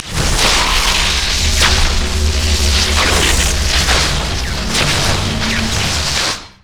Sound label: static field; LoL; jayce